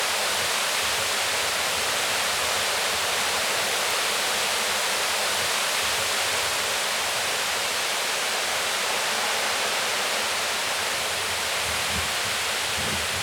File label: Trees in the Wind, Whalley Lake; Loopable; Cottage Sounds; whispering landscapes